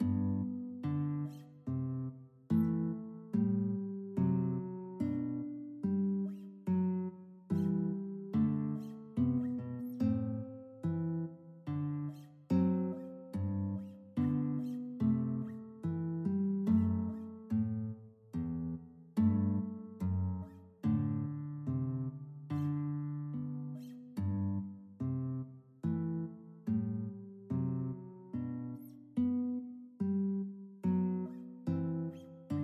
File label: guitar